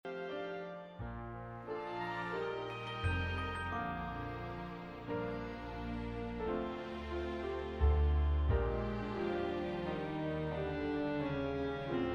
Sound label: instrumental